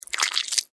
クリーチャーが食べる音

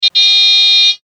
クラクション単音ププー

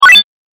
8bit 選択

